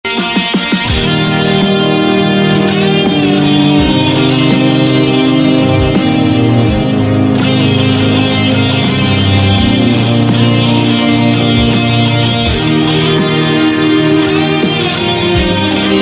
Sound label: guitar, ringtone; blues